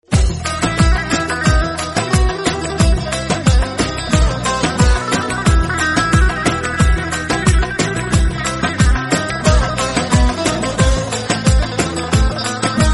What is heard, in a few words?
turkish saz ringtone